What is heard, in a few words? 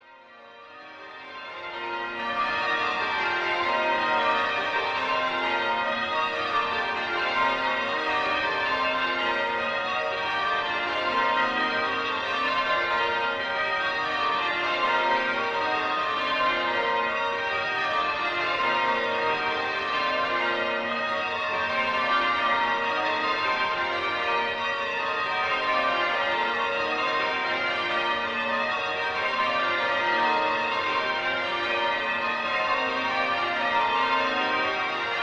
Cathedral Bells